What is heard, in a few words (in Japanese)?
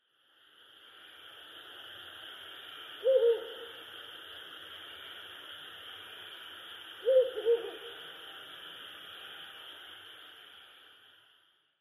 fukurou